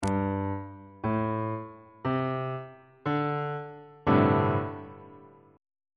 c double sharp dominant 7th suspended 4th 1st inversion on bass clef